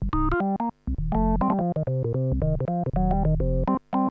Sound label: funky b3